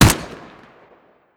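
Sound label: ump45 9mm, gun; arccw go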